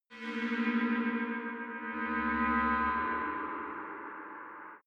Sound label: shuttle laser